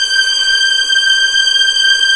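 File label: G6 legato, The Ultimate String Collection; East West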